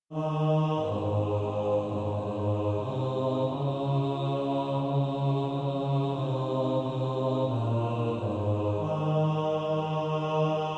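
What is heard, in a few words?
bass, practice music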